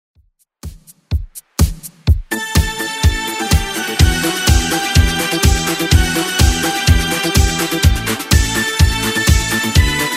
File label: 80s Redrum 125bpm